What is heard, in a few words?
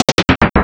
Countdown; Total Drum&Bass; 90 s Sample CDs; Hits & Bits